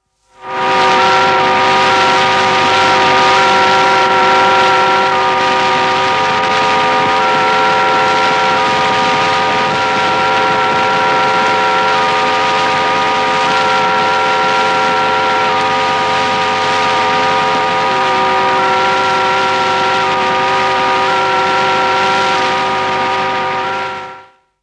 Explorer 1 Satellite, telemetry effects from satellite; Communication; BBC